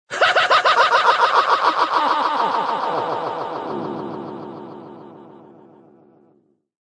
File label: Laugh SciFi, Male laugh, cackle, descending pitch, sci-fi, w. FX, (human voice); Laughter